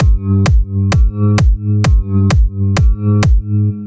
light sidechain

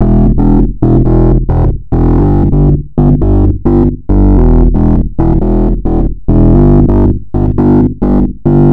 Heavy Distort; Camel Crusher

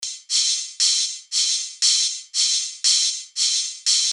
hihat house flow

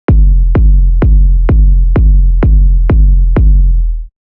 Loop Kick

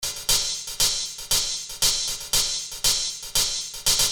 hihat house flow all open hats

